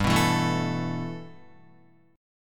G 6th Add 9th G Guitar, chord; G6add9 strummed

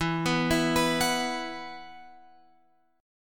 E Suspended 2nd E, Guitar, Open F; arpeggiated; x,11,11,11,14,13; JGuitar

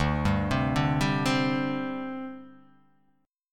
D 7th Suspended 4th D

